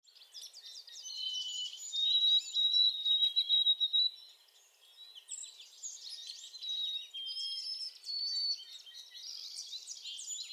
White-throated Sparrow

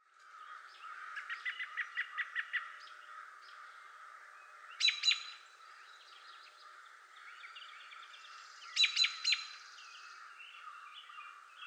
American Robin

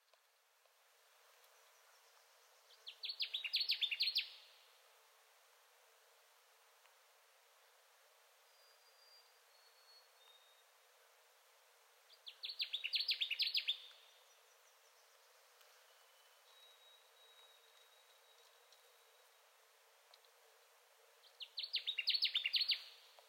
Connecticut Warbler 7 songs

